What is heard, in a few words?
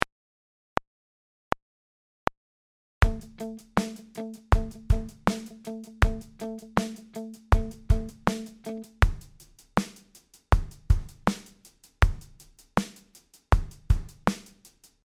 groove